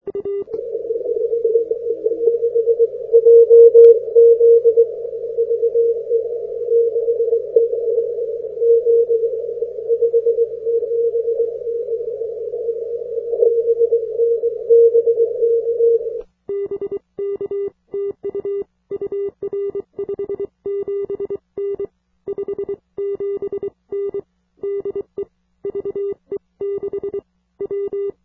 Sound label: 80m CW; Namibia on CW; V51XG